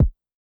Kicks

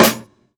snr

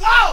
Woh Vox

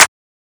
Claps

